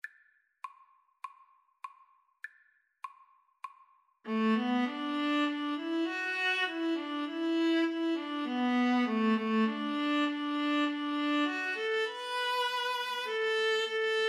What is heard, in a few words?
Viola Duet